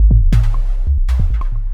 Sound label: percussion loops, Techno; PS-45 WAV Techno ID